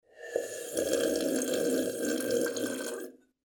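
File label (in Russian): звук хлебания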